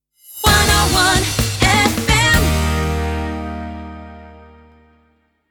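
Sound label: Jingle Sting 128bpm Eb Major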